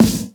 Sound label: 2000s dope hip hop snare drum sound a key